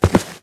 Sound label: Footstep Walk Base